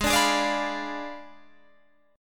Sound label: Ab Diminished 7th Ab Guitar Standard; Abdim7 strummed; JGuitar